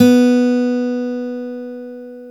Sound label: GTR B3C, Dual Guitar, Ac 12+; Club-50 - Foundations